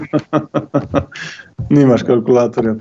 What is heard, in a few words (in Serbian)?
smeh